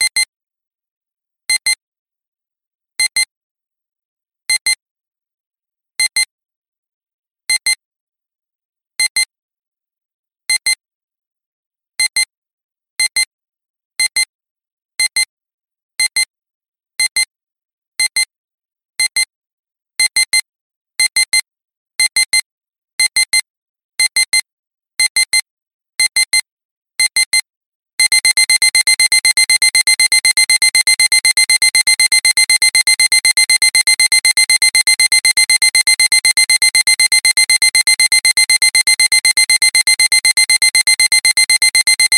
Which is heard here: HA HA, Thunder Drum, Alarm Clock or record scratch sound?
Alarm Clock